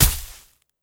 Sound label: Sting Attack; Legend of Keepers